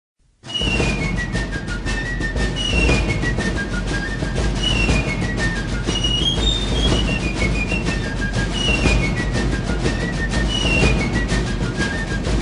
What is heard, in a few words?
Fife and Drum; Garry Owens